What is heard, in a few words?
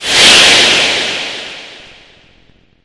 motion; animal